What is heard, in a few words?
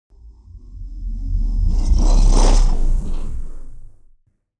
Whoosh